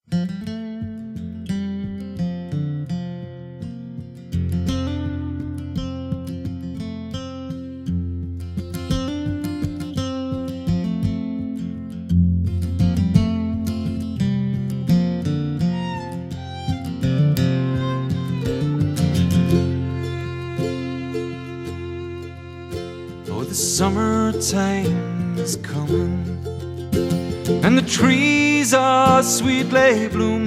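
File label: wild mountain thyme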